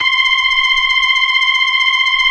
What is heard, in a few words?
C6F, B3